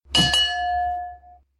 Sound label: Ding Ding; Little Mac